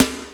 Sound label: Snares Real